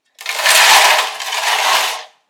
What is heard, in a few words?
Metal Box Sliding